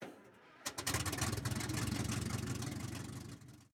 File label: start engine, engines; World of Tanks